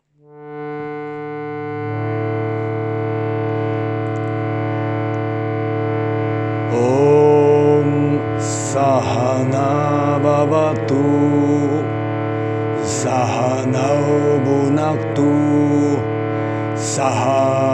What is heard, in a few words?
Shanti Mantra